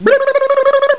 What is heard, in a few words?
wrong